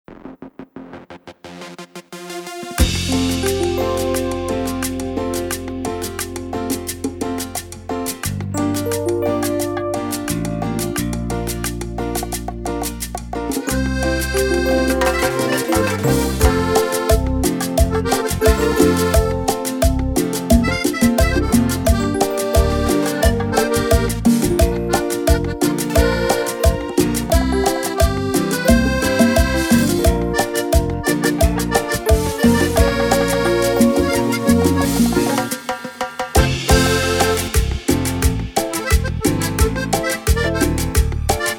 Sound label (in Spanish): Versión Cumbia